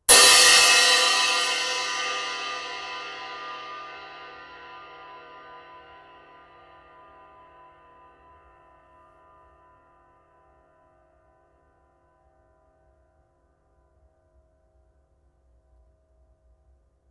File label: 21in Mega Bell Ride, cymbal